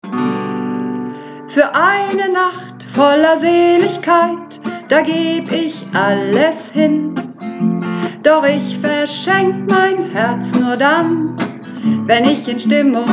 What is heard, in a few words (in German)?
Hauptstimme